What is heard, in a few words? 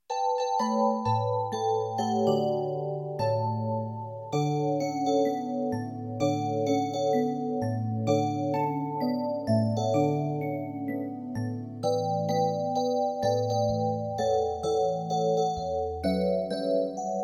Jingle